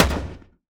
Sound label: Shotgun; GlobalGameJame